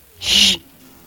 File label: shh; gracie films